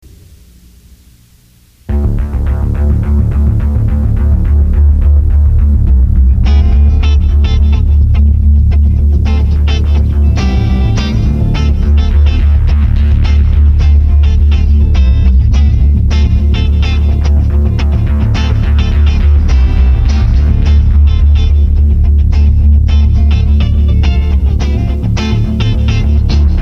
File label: FunkGroove; Moon Studio recording (early 1990's); Michael Hopkins - Composer; Sequencial Circuits Pro-One Synth